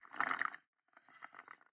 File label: Juice Drink; MiSide